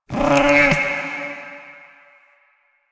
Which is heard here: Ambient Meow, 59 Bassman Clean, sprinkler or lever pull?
Ambient Meow